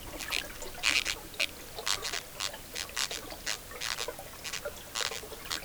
Agamyxis pectinifrons, Sounds of fishes in the Peruvian Amazon